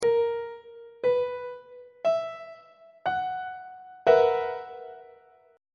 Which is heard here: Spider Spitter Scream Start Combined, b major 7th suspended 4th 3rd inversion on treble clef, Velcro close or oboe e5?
b major 7th suspended 4th 3rd inversion on treble clef